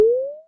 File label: doop